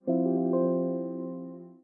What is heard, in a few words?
Windows Notify System Generic